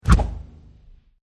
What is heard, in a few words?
wrong